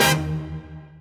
Combo Paper Hit; Bee Movie Game; Party Crasher

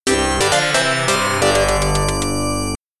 Level up; Final Breaker; pc 9801

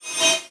Messagebox In; Nicktoons Nitro; arcade

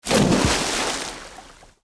Splash; Scrolling Splash; lego my style preschool

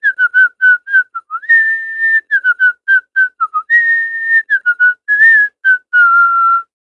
Whistling Vivaldi

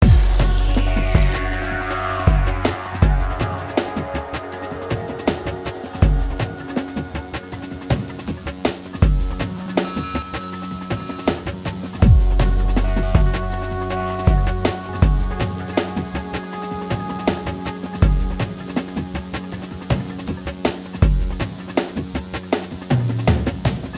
Initial Experience Ambient; forza motorsport 3; xbox 360

